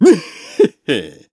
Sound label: Laugh; Groose laughing, waving goodbye; zelda sounds; Skyward Sword